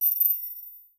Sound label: notification